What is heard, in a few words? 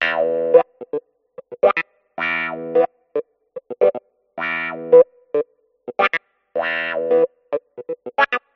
clavinet